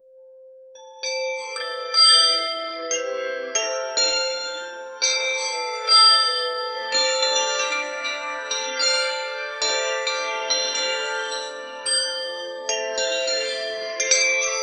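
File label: whimsical mystical woodland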